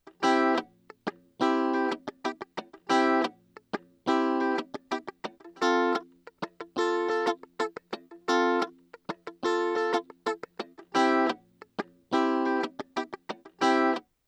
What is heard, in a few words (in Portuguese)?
Guitarra chicabana